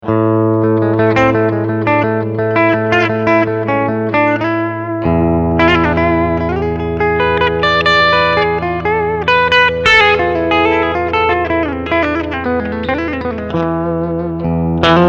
Singlecoil clean

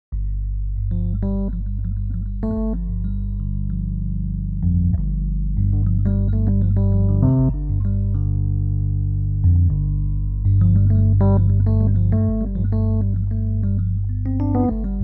Rhodes warm

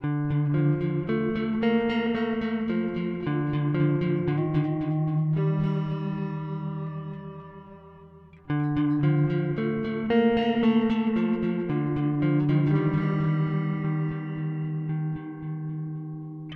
Starlight Echo Station Precision C

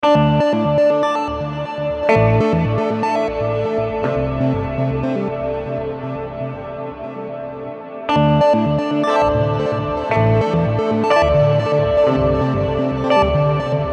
Mooer GE 300

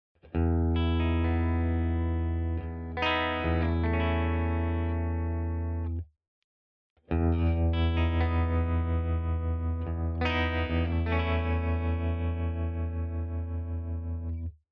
Walrus Fundamental TREMOLO Sine

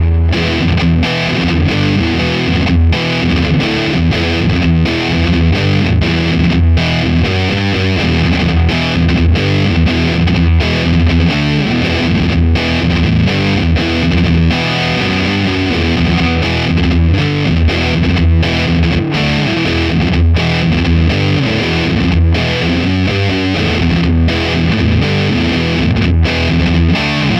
Lead

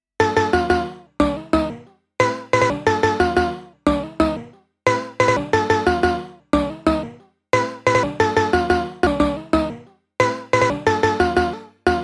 CV To Pitch Sample

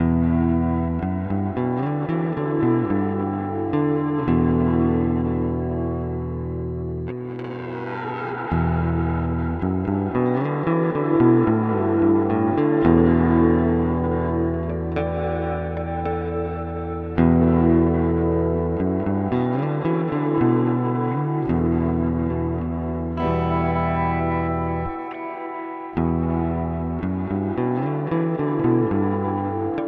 Walrus Audio R1 BFRCT

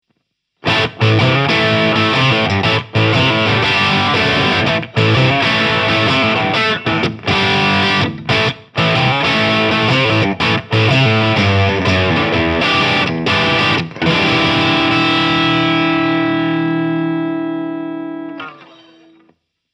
Engl Powerball Blue Channel

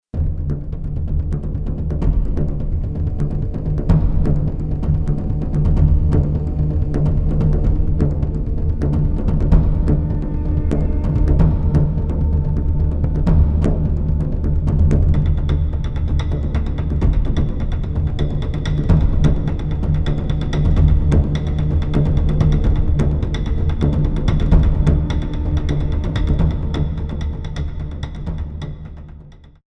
performance grooves 128 bpm; man hunt

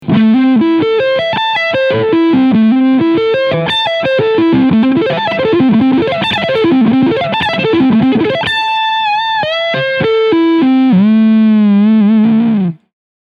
ARPEGGIOLINES

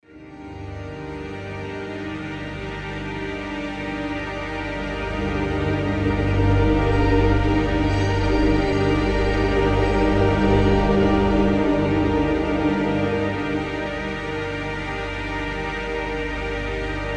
textures arctic plains; NI arkhis

